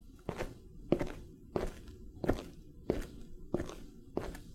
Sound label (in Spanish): pasos